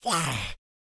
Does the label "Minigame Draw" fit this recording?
No